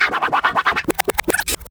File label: Vocal Scratches 140BPM